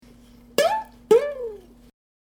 wolf whistle, ukulele

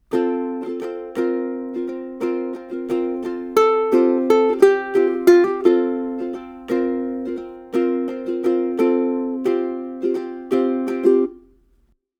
Lick, ukulele

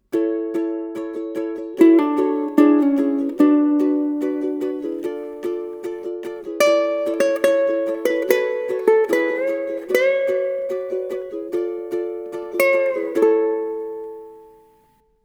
Verse Solo, ukulele

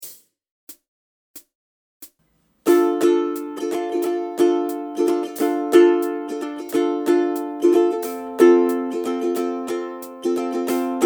Strum, ukulele

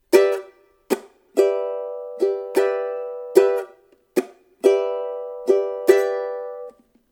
Strum, ukulele

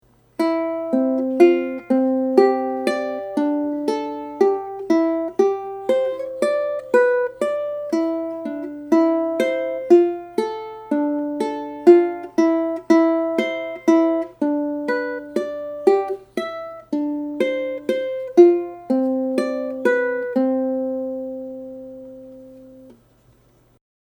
Melody, ukulele

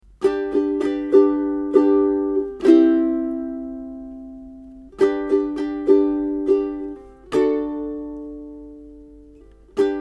Night strumming, ukulele

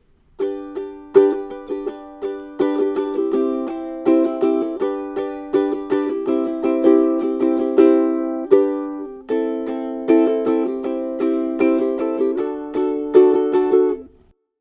Strum, ukulele

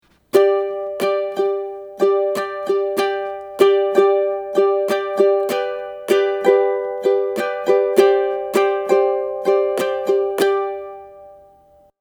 Strum, ukulele

